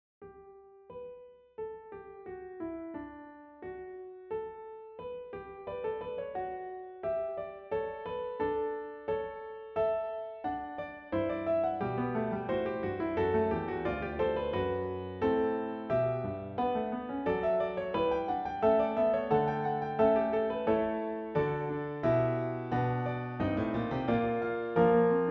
piano

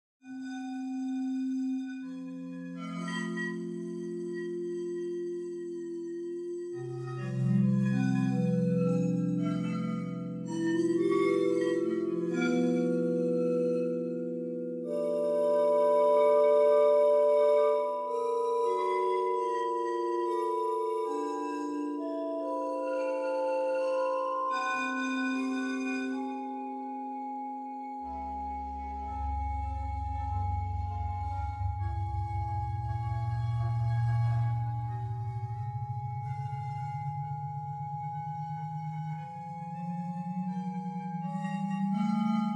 Bowed Gamelan Ugal

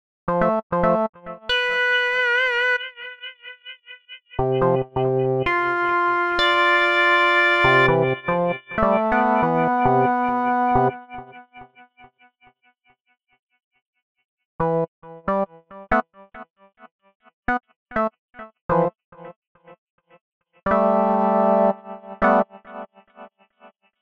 Tempo Delay

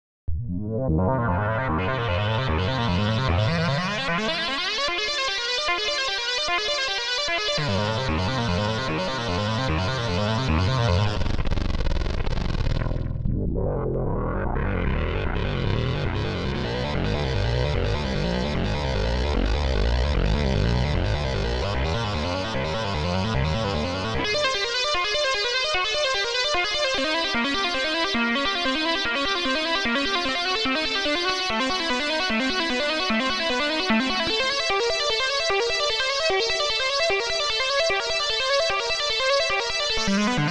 ARP 2600 V

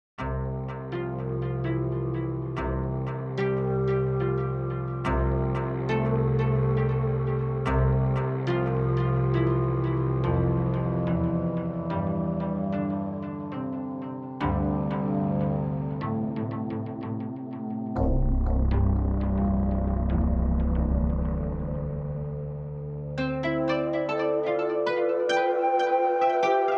World Instruments

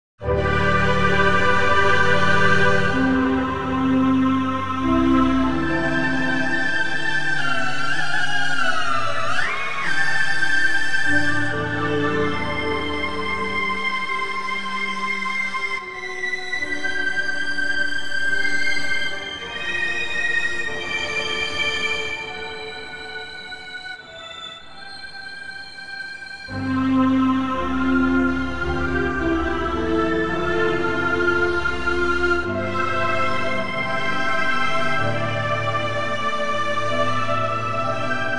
Ultra Analog

